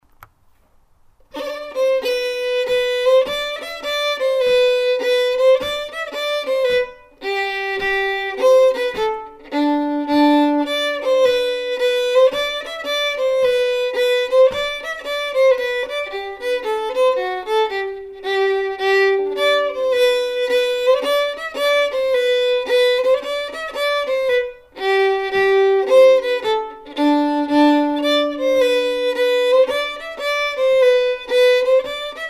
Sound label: Grand Hornpipe, fiddle